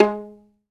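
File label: PIZZ VIOL, Solo Violin; E-MU Producer Series Vol. 2; More Studio Essentials; Composer; St. Arco Solo Violn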